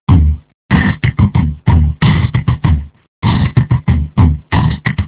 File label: beat box